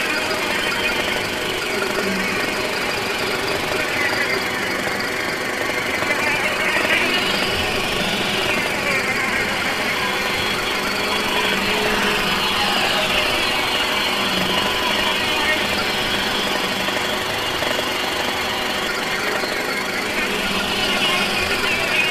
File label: Worker Drone Hover; Titanfall 2